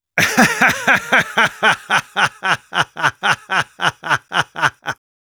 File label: laugh long; Sniper